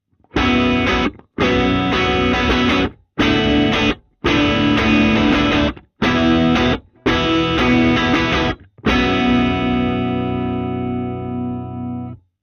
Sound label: Guitar Box - C414 BiDirect; bidirectional (osmička); edge duo srovnavaci test